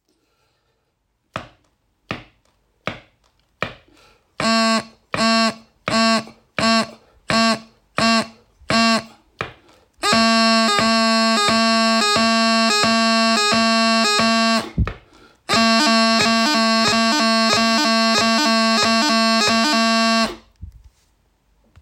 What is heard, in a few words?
beat falls